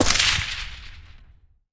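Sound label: fire suppressed distant, weapons m16; Urban-Coalition; Decay; arccw ud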